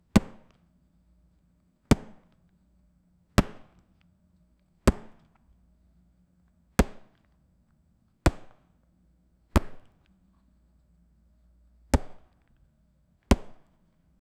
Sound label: fist hitting boxing bag